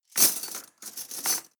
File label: cutlery teaspoons drawer place hard